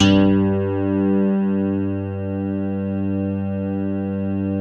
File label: ROCKIN BA; Greytsounds; Scott Peers Sound Engineering